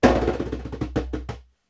conga licks

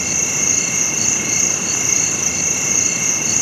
ATM SWAMP, Swamp Atmosp; Roland - LA Composer vol. 2

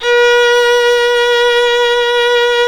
Synclavier Strings; ILIO

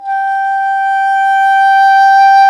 English Horn, Orchestral Winds; Roland LCDP04 Orchestral Winds; Eng Horn Swl

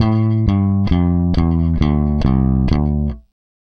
Groove Pluck, Bass; Bass X

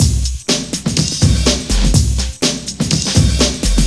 LOOP 124

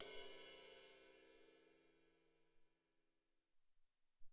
Ride Cym, Alesis SR-18

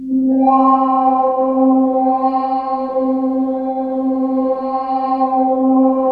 wake up pad; WAKE UP LYR; Masterbits - Tekkno Trax; SYNTHFX+PADS

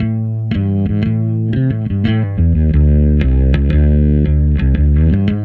Bass

